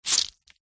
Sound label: horror gore fresh fleshy guts bloody squeeze gouging squelch